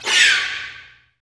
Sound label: STR SEC, STRING GLISS